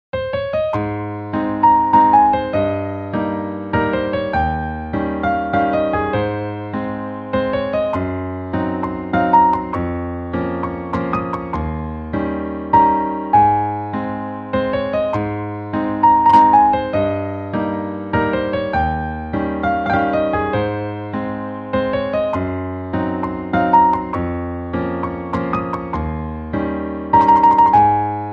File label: music